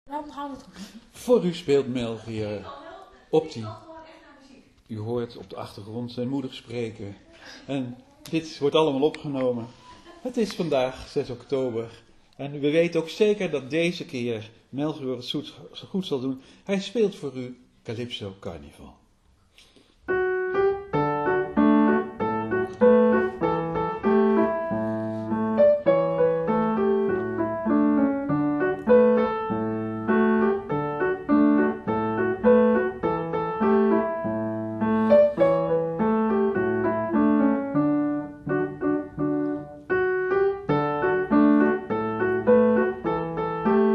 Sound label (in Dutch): speelt calypso carnival; melchior speelt calypso carnival